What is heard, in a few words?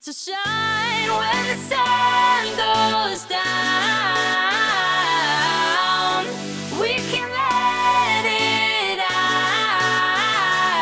Synth